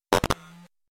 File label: video glitch